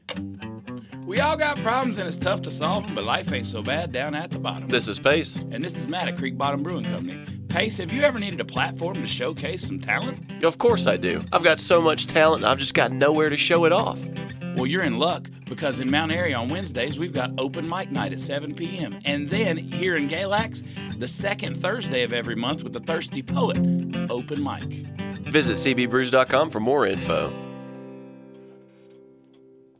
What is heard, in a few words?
open mic; Radio Spots; Creek Bottom Brews